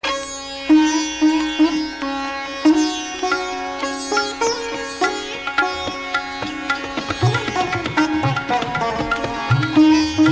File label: Dhun, North India